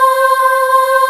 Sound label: Choir C5, VOICE; Best Service Gigapack 1